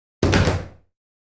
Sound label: Ping Pong Paddle Tos